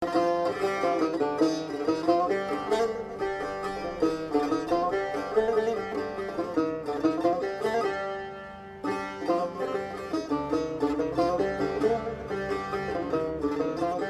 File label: saghar